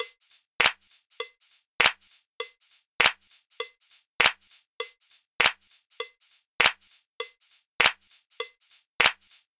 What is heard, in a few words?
Gospel D 100bpm perc track; Talk To Me Gospel 4